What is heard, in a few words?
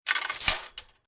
Chain Impact Drop Chain; Metal Sound Effects; Chain Sounds; LYN103 package of Chain Sounds